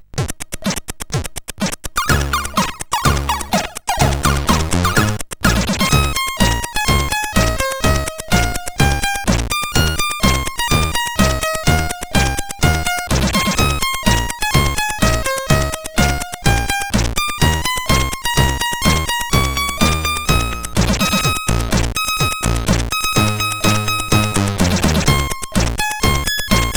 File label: Berliner Luft, sid CSG8580R5